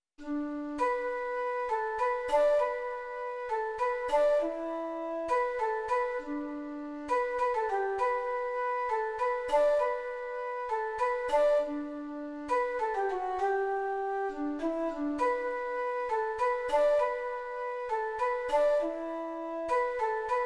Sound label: melody